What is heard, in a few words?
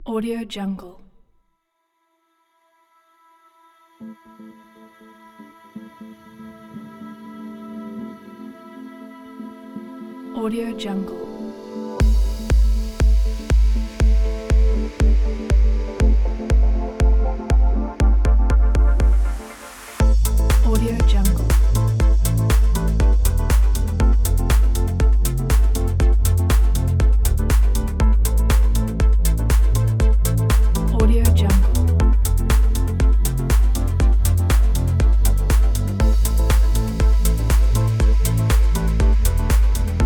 deep house ambient